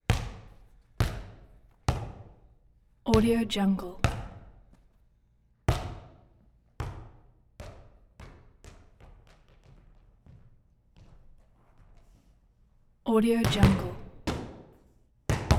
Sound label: volleyball hit wall floor; human sounds